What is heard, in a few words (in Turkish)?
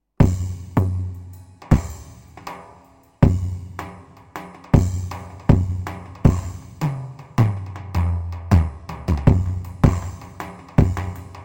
pa 500 tr harmandali, korg; tr karisik ritimler